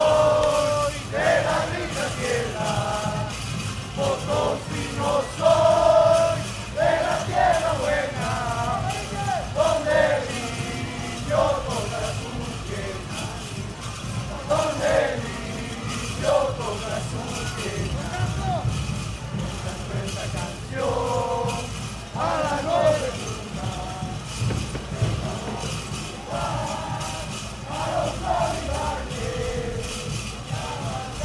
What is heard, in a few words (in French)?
Bolivie Sucre Chant pour le Défilé de la Vierge de Guadalupe